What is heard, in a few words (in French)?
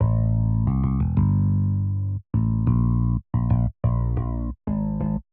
compo msm basse